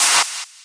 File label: Clap; Take Me Away